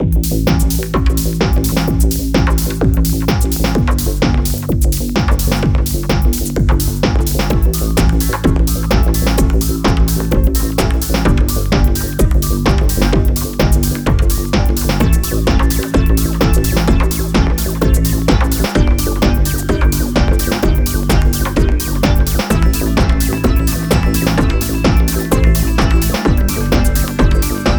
Dance Hit Sequences; PolyKBIII; Cardinale

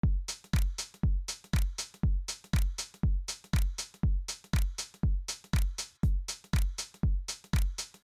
Low Pass Drums; XILS

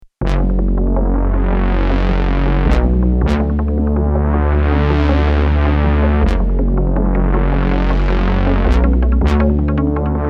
brass effect

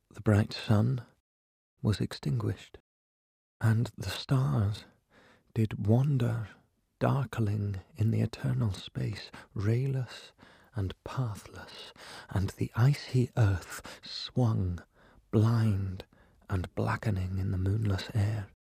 Low Pass Darkness; XILS; Byron; BBC

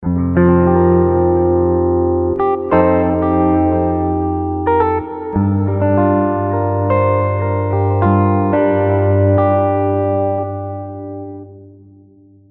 Jazz Guitar; ChorX

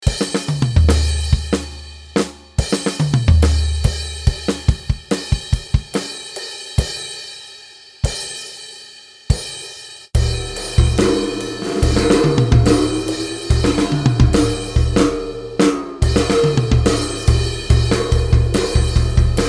Demo With Drums; LeMetalique; Ubukata

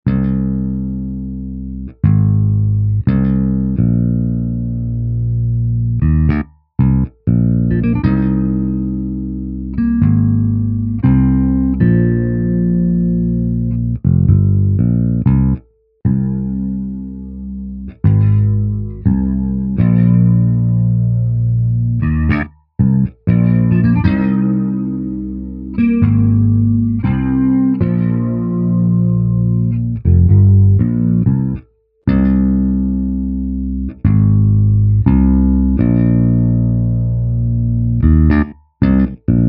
EBass; ChorX

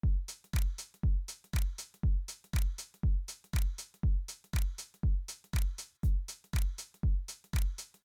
Just Reduce Drums; XILS

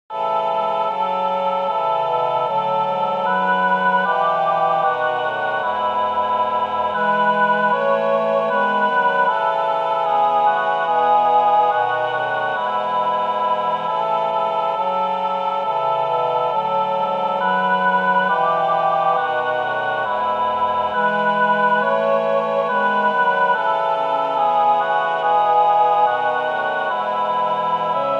Bach Choral; XILS

